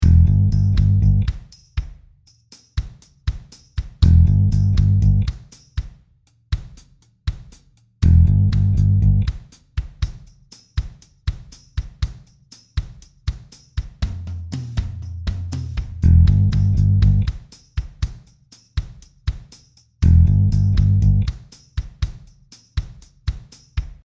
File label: bass